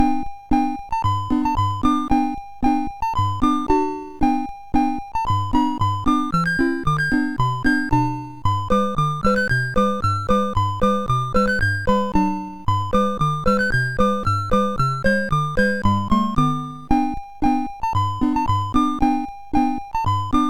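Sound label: Maxi Golf, MOS 6581 SID chip music, games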